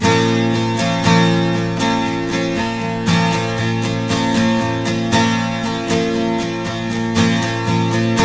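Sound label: 12 string minor B, guitars; Spectrasonics; Hans Zimmer Guitars VOL-1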